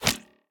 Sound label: roman ranged bow impact; smite; npc; roman archer